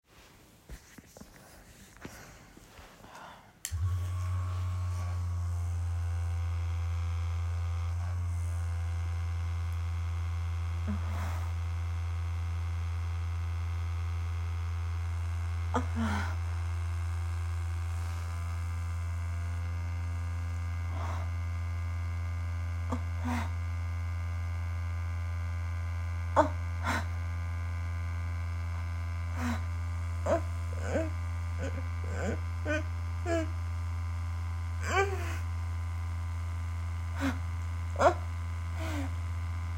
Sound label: no speaking moaning heavy breathing, F4A; 2 Fast 2 minutes